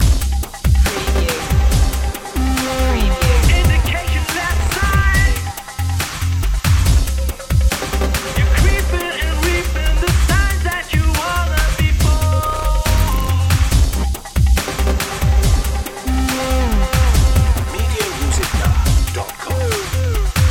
kick it up

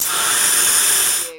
Pneumatic Valve

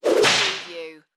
Whip Crack Bullwhip; Sound Effects Bible

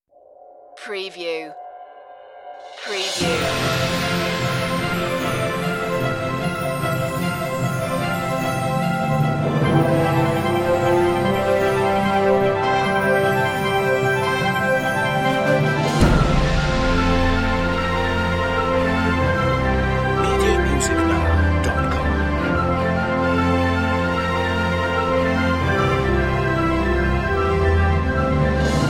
no choir version

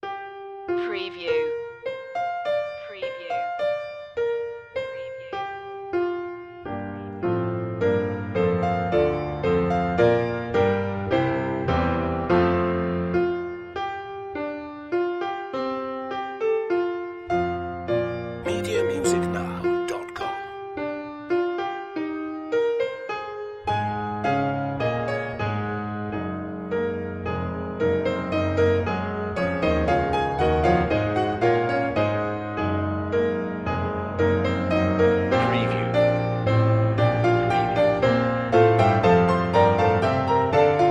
promenade b flat major; pictures at an exhibition 1st; classical music for media

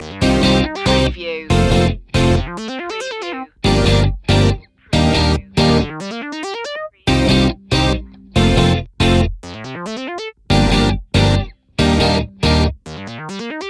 full city loop

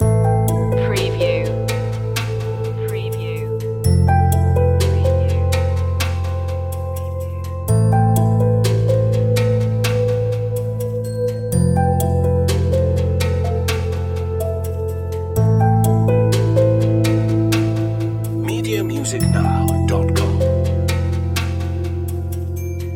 a glow loop

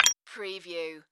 Multimedia Percussion

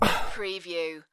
Exhale

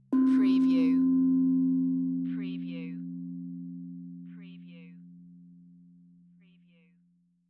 Tongue Drum Meditative Tone; beanstalk audio

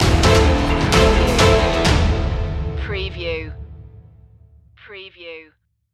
stock market crash stinger

